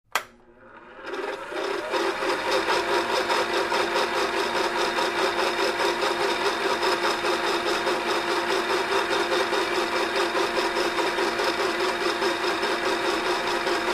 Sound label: Juicer Broken; On, Runs With Bad Squeals, Off